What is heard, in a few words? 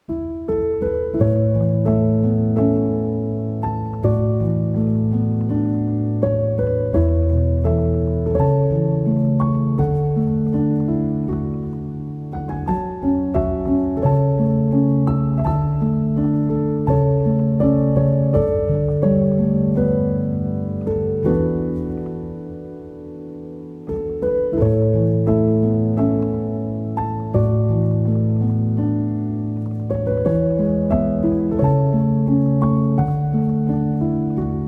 Sleepy Version, Piano Version; When Marnie Was There